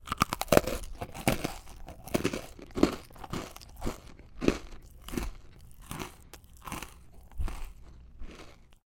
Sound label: Human Eat Cookie; food; Gameznet